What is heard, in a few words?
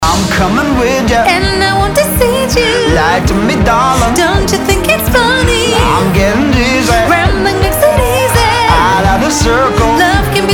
marchingband